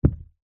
wood small heavy dense piece drop light carpet thud; foley